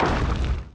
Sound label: asteroid collision metallic small; Factorio